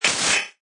Distortion Stab Whoosh Metallic Rattle, Science Fiction Sound Effects; Scifi Production Elements, Set 2